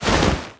body oneshot, foley

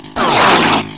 incoming, headcrab canister; Half Life 2

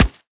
cardboard, bullet impacts; Half Life Model Pack

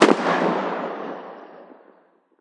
mag7 distant; Counter Strike Model Pack; CS2

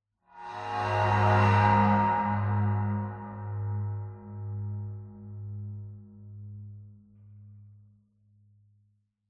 cymbal high, ambient; cymbals

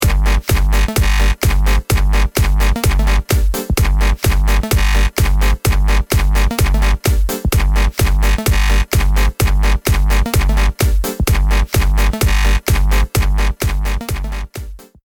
start action, music

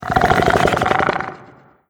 idle croak, barnacle; Half Life Alyx

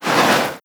body slide, antlion; creatures

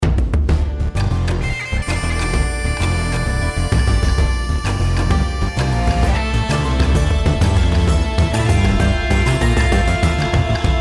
start action

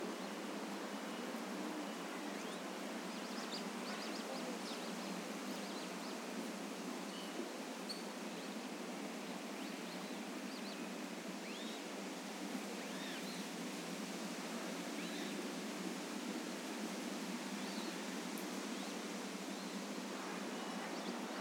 trees and birds; ambient; dust2

